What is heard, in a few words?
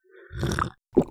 drink